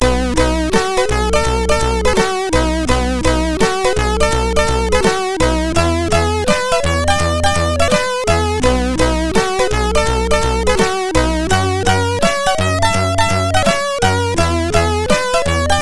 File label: Just a Test Tune, MOS6581R4